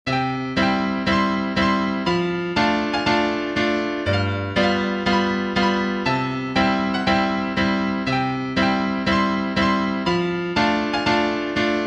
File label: piano